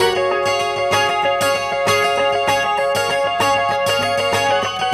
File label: guitar, stringed instruments